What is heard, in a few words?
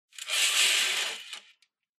Curtain